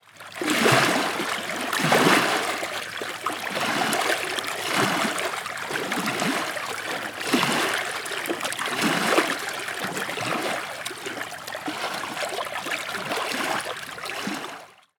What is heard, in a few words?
lake waves lapping on the beach; nature